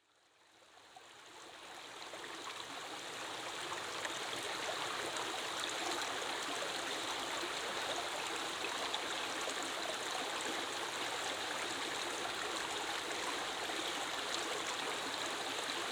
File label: Brook